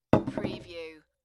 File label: Putting Down a Bottle; beanstalk audio